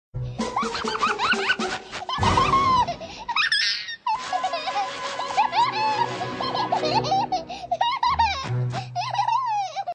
Childrens Laugh

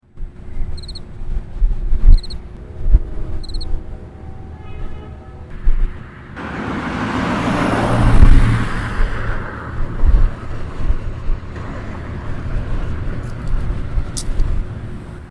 Insect Sounds In Nature

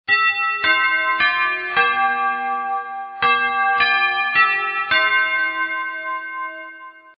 Thick Sound Of Bells

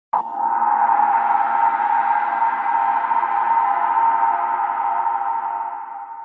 Gong Is Gong

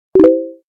Facebook Chat

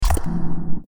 The Only Test Tone

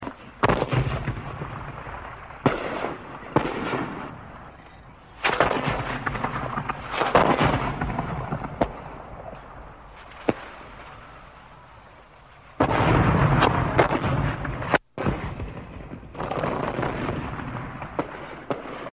3D Bomb

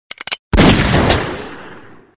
Gunshot Sound

